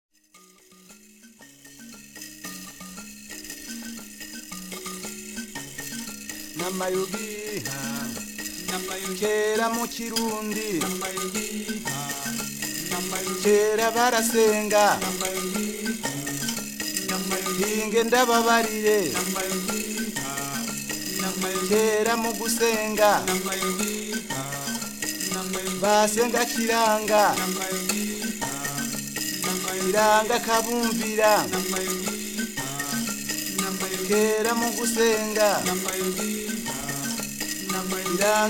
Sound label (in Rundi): ikembe, burundi